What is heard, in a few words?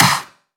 Wet Snare One Shot C Key, snare single hit tuned to the C note